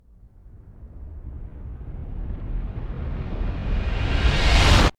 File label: Cut Scene Stingers